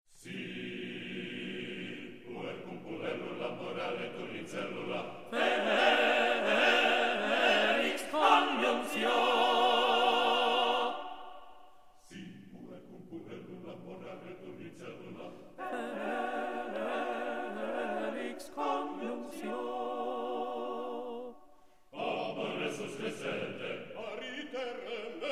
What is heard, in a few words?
Tenor 2 up to tempo; Si puer cum puellaula